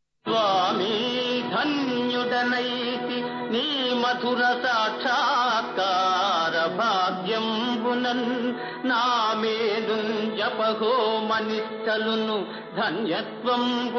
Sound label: Padyam; BhuKailas